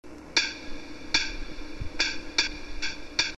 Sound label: clave